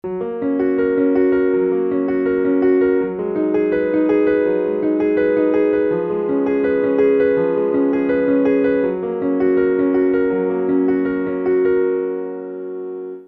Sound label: Prelude F sharp Werckmeister